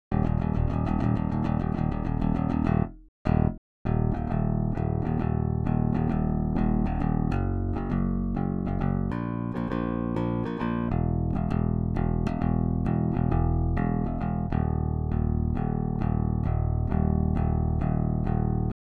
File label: Signal